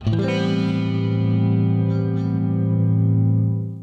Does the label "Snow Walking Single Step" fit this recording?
No